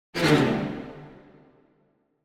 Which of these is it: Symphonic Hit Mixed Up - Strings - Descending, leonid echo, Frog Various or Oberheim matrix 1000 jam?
Symphonic Hit Mixed Up - Strings - Descending